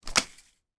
letter paper drop on table and slide; sound effects one

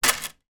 household plastic jewelry place down on table

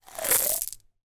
sweets chocolate shells pour from tube into hand; smarties

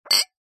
household glasses drinking x2 clink together; zapsplat

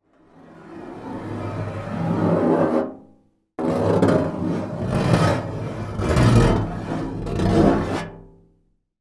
foley metal scrapes resonate hollow; zapsplat

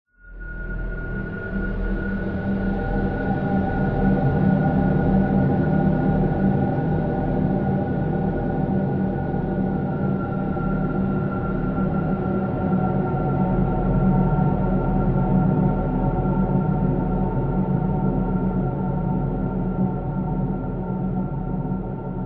horror atmosphere dark disturbing airy whistling; zapsplat

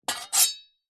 sword small pick up from hard surface

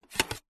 household plastic plate pick up lift off another

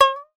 cartoon ping twang string pluck; zapsplat

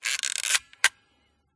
smena symbol camera wind film lever; mkh8060; PMSFX

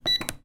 dryer button press beep; household; zapsplat

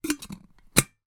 household kettle metal lid place on; zapsplat

